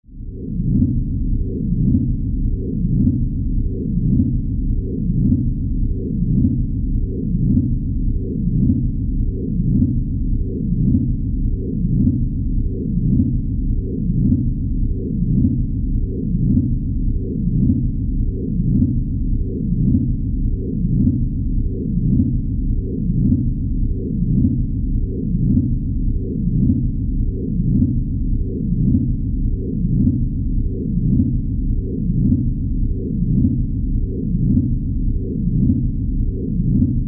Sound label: Sci Fi Alien Heartbeat Drone Pulsing Throbbing Ultrasound Sonogram; Aliens